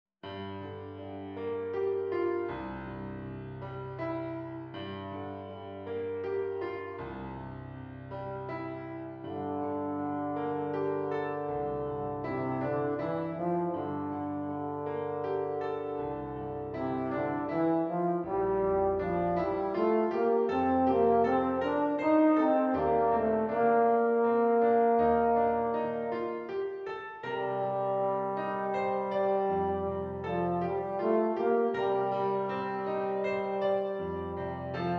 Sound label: euphonium